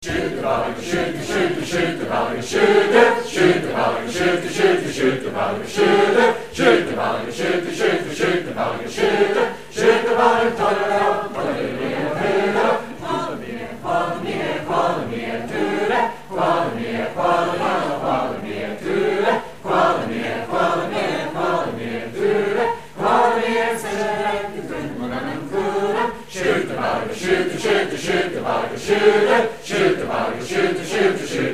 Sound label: Gaelic Choir